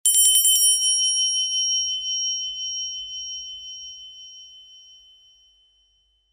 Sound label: bell